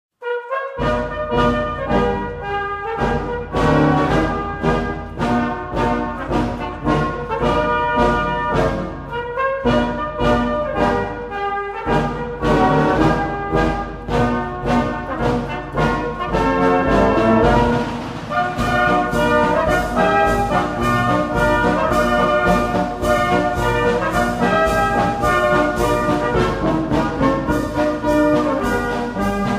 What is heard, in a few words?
instrumental; Battle Cry of Freedom